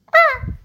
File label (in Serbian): crvić umire